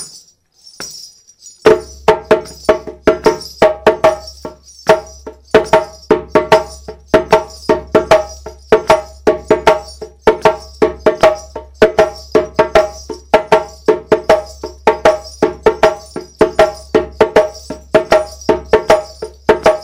Djansa, djembe

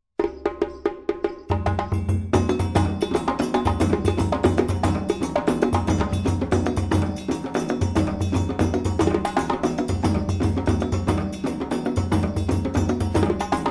Kassa Volledig, djembe

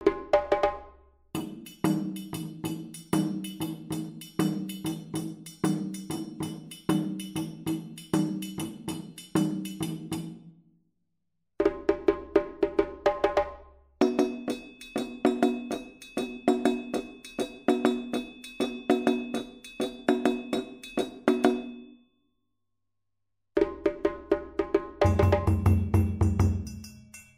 Kassa Dununs, djembe

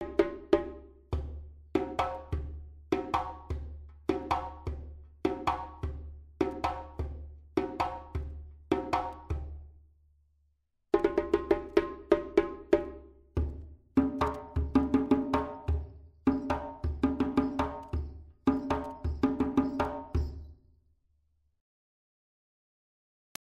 Kakilambe, djembe

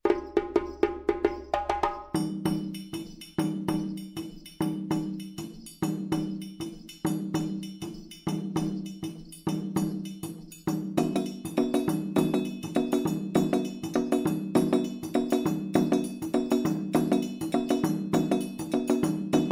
Kuku Opbouw, djembe

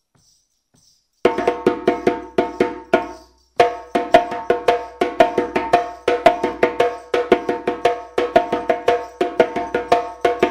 Djaa, djembe

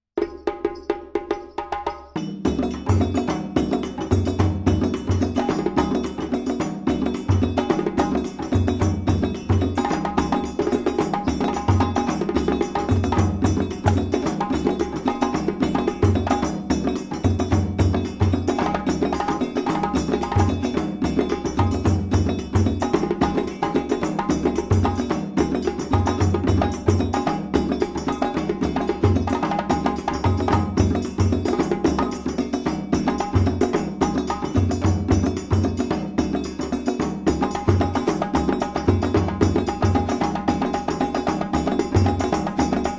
Kuku Volledig, djembe

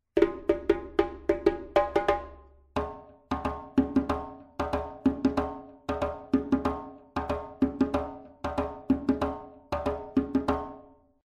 Balakulandjan, djembe

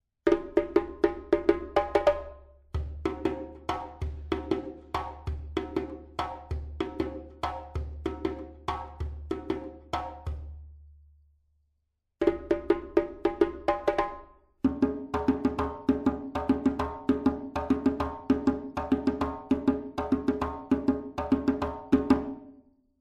Kuku, djembe

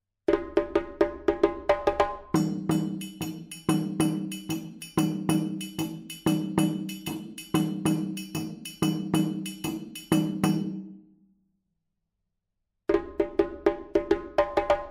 Kuku Dununs, djembe

